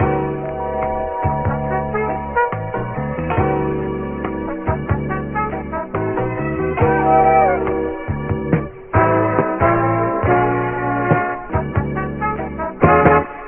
strings and horns, funky drum loop

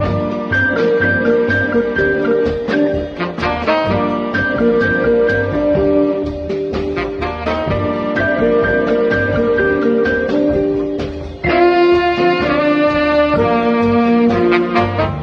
keys and sax; loops; Phonky

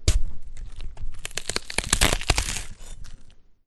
bone break stab and tear

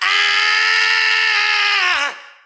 ah sings; Voice; ooh ahh

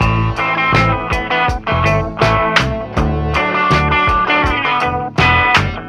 guitar, Loops, Phonky

